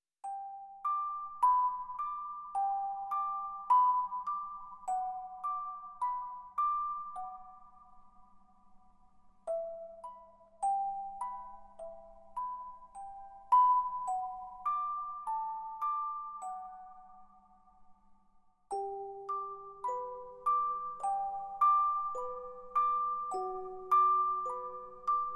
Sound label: Celesta